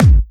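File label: BD Trancy, bass drum; Club; VEC1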